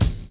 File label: DRUM CHOP; AMG Gota Yashiki Groove Activator